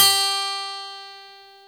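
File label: guitar G3; AKAI S-Series CD-ROM Sound Library VOL-8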